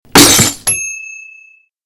Glass Breaking Loud

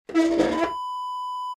chair scraping floor

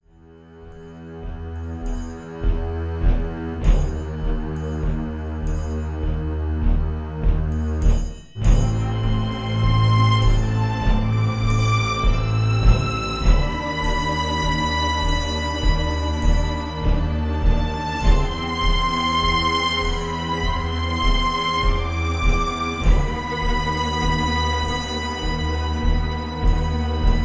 Gloom Horizon

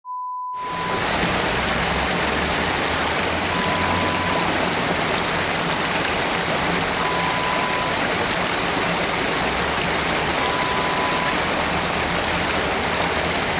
Waterfall sound

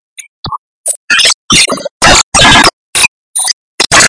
Horror Screeching Tension

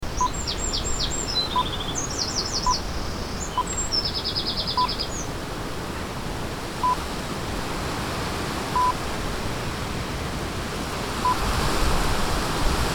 Tipi Campsite Wind Trees

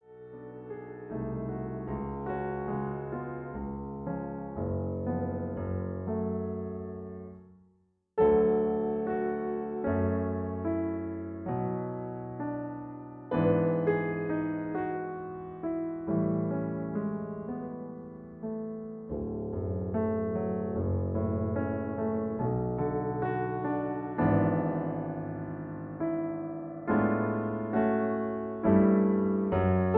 Accompaniment F sharp; 4 Serious Songs Op 121-2 Ich wandte mich und sahe

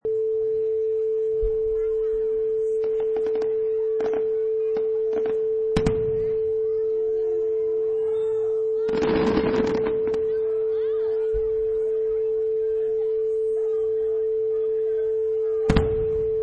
Firework Display

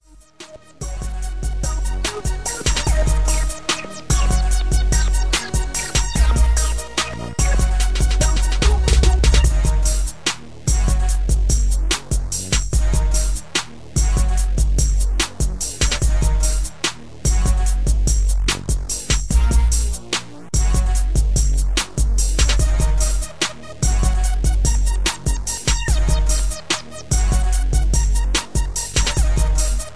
73BPM

